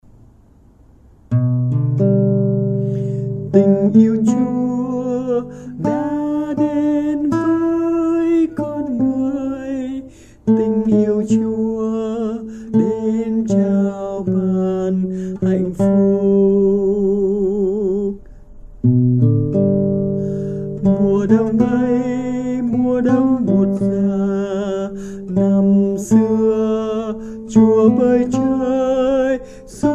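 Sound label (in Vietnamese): Tình Yêu Chúa Đã Đến, bè Tenor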